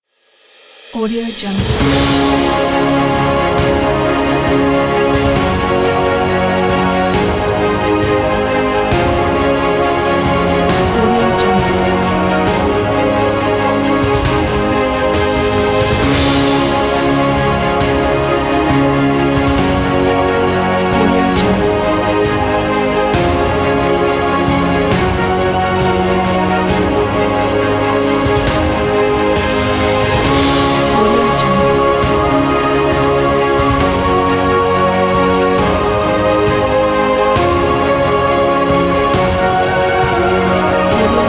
ceremony

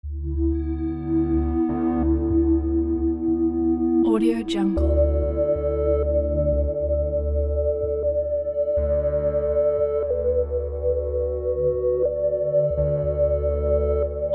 calm atmosphere

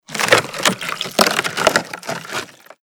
small floating ice break

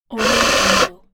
servo motor whir

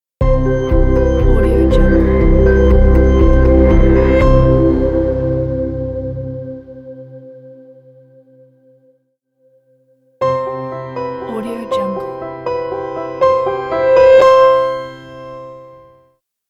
modern piano corporate logo

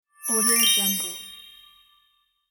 magic surprise

